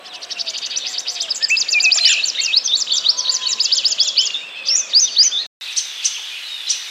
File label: bird audio